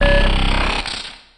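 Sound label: Laser Fire Interior Empty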